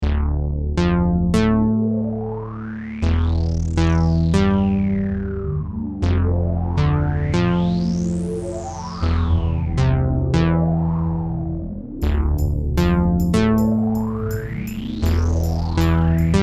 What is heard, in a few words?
MIDI ditty